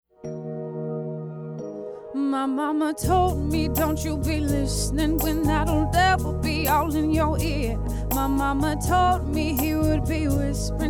mix e835, handheld mic; shootout

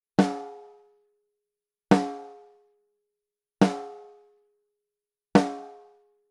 Daking Pre IV, snare drum; preamps

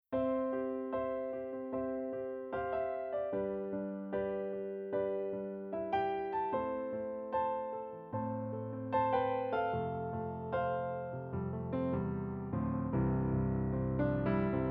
Concert Grand, digital pianos

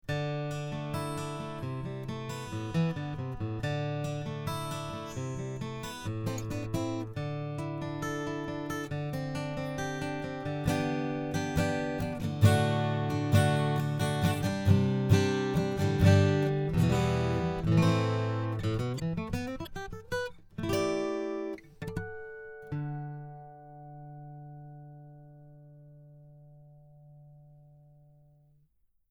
Stock tusq pins, acoustic guitar

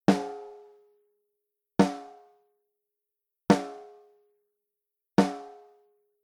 Great River MP500NV, snare drum; preamps

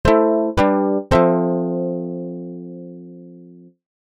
Tension; Sweetwater Creating Great Sounds; creating great sounds in your daw

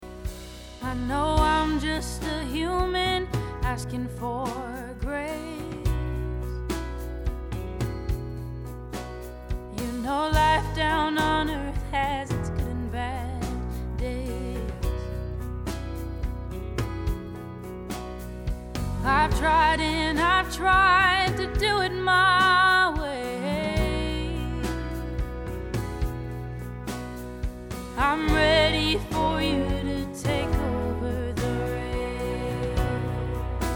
Track SM57, virtual mic

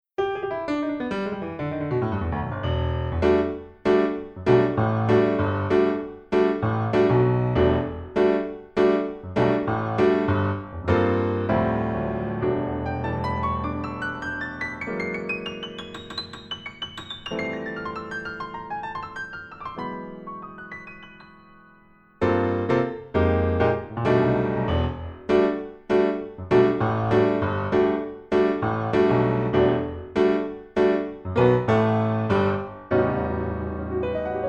piano; Native Inst Alicias Keys; virtual piano

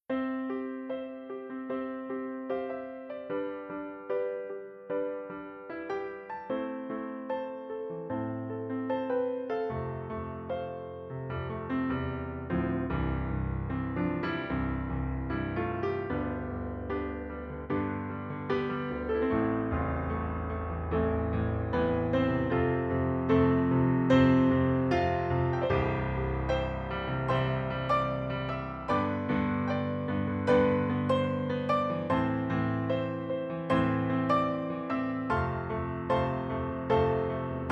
Austrian Pno; digital pianos

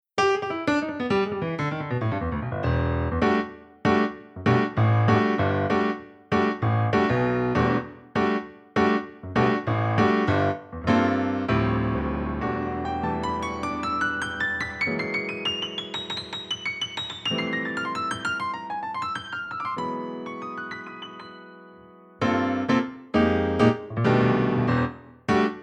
Waves Grand Rhapsody, virtual piano

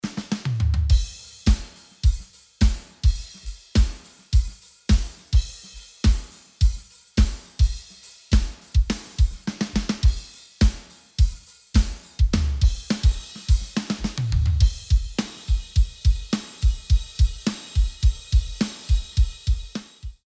Drums; waves; pultec shootout

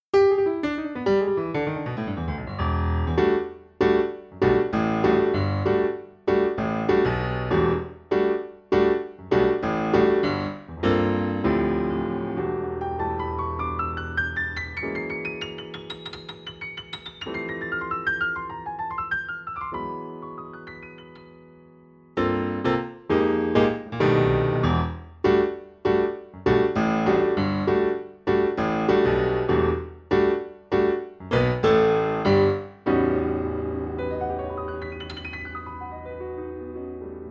Ivory American Concert D, piano

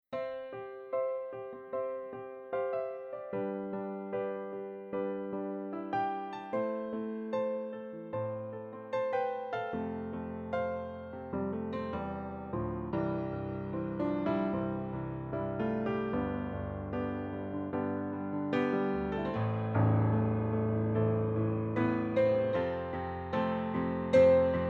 Rich 7Ft Grand, digital pianos; Kurz Forte; best digital pianos shootout

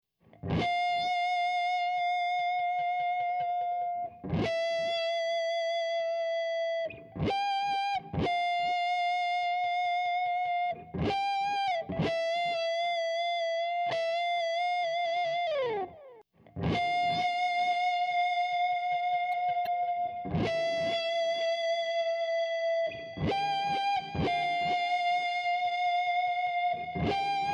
Gtr Stem Dry Wet; Bob Clearmountain; Apogee plug-in

